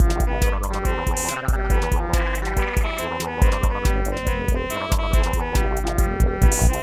Cartoon

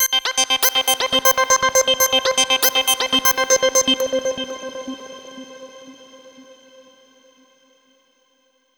Overlay Tone; Act3 Downtown

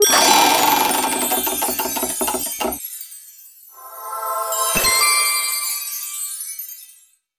event fortune wheel spin

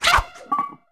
Trumpet Idle; the princess and the frog; Bayou; Tag Keepaway

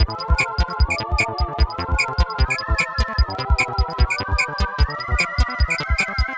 Lovely

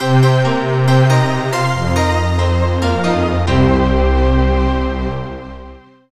Unused Jingle; Dragon The Bruce Lee Story; SNES

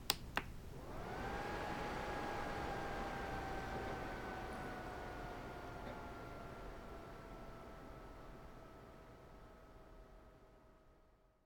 bootup

